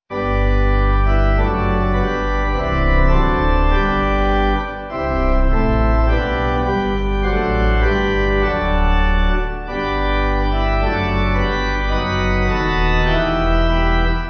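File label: Pipe organ, church music backing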